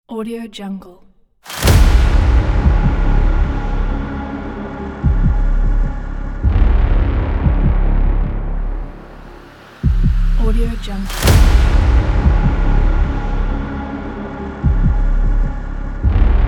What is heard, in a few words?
trailer epic intro ident